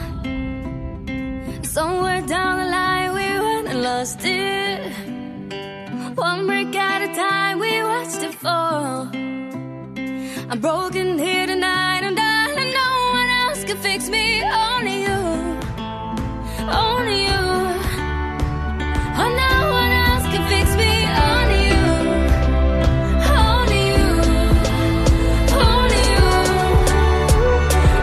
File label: ring tone